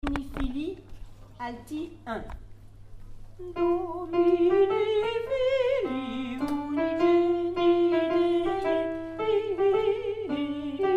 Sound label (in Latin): domine fili alto; gloria de vivaldi; Domine fili alti 1